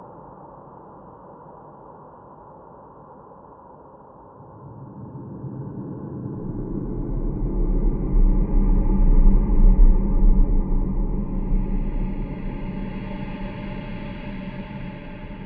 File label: tonal plains city loop; the walking dead survival instinct gamerip